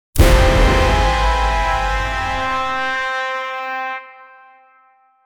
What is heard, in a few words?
action trumpet; brass instrument